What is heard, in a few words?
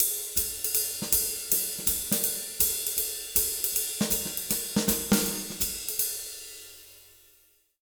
160 SWING, Jazz Latin Drumloops; USB Soundscan vol.08; AKAI